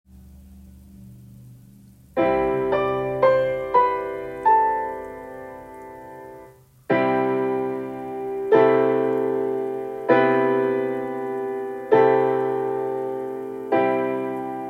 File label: accompaniment